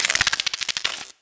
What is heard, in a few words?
scorpian hit; DAoC